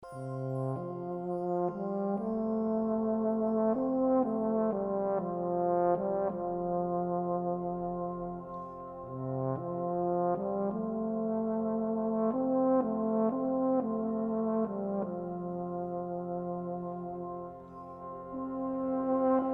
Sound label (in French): extrait baryton